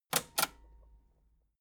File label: metal button cassette stop fast